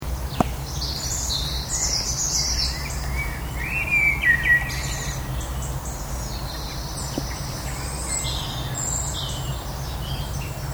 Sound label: blackie blackcap wren robin